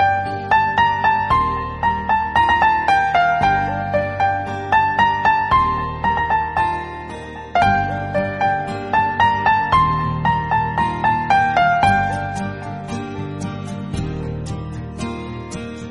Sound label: Instrumental